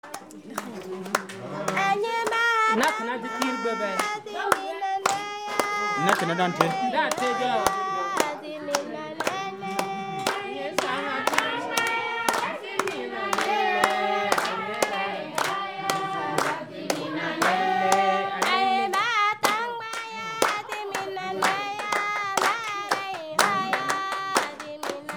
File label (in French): yele chante accompagnee par les femmes; voyage au burkina novembre 2018